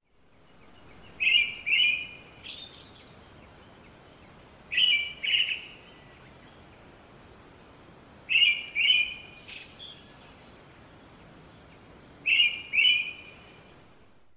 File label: singing